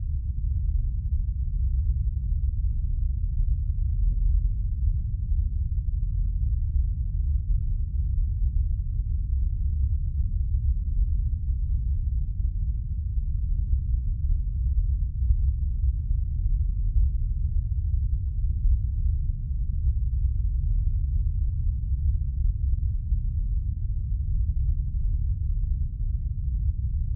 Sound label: Rumble